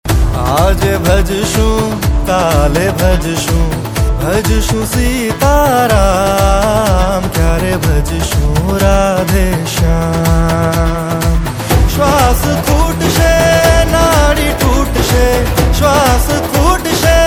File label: Bhajan Mashup gujarati ringtone